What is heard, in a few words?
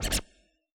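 scratch high; AnlgKit1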